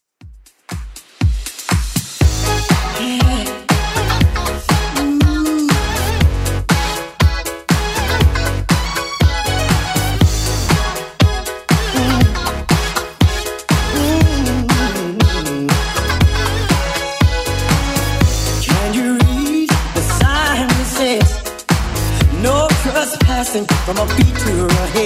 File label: 80s Club 120 bpm